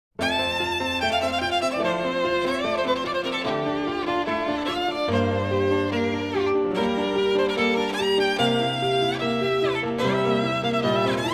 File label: Violin Sonata No. 5 Op. 24 Spring; Nodame Cantabile; First Movement